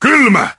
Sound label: ice wiz atk